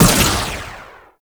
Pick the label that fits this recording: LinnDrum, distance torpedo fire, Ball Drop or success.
Ball Drop